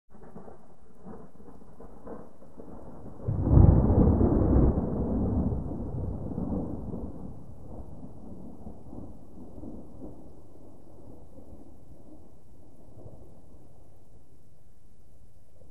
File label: Thunder Medium Distant